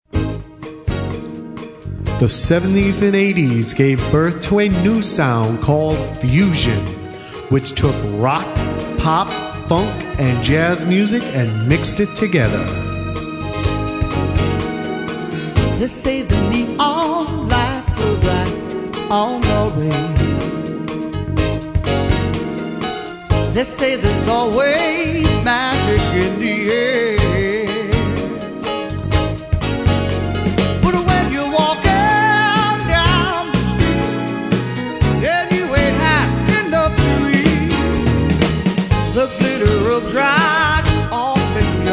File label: Funk